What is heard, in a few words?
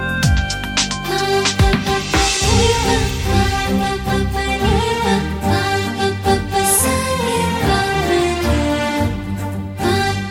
BG Score Telugu Ringtone